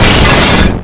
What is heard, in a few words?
crystal break